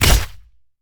blade

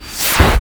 black cannon full cast

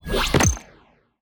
ui switch core

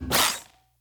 wire

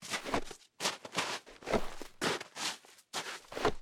crutch snow

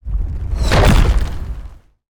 rock break out

